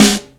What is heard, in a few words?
studio snare drum sample g sharp key; Duration 0:01